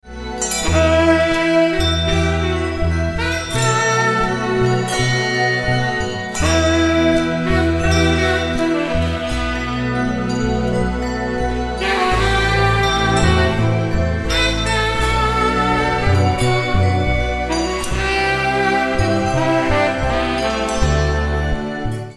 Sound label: anvil rings; Treloar and Ballard Schools; Dave Jackson; Beams and Bells